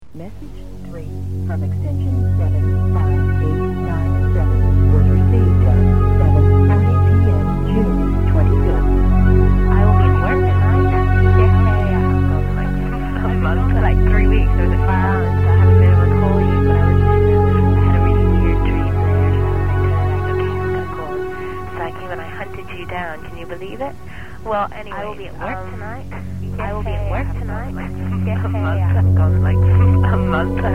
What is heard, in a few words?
Synthesizer Heaven